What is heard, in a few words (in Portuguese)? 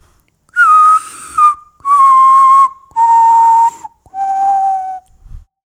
assobiando como o urutau; ele se disfarça com suas penas amarronzadas, ficando igual à madeira; Pia o pio… Os pássaros e as árvores que fazem parte da vida do Martim